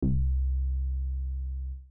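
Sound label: 140 explosion bass sound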